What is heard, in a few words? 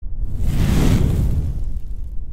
quieter flame